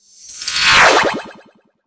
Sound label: zapping sci-fi swoosh